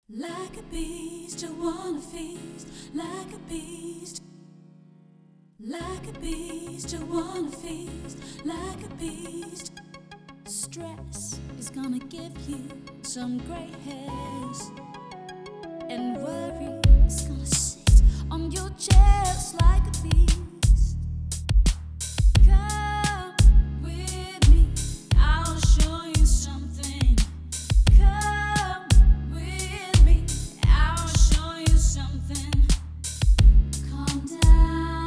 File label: vocal chill, 432 hz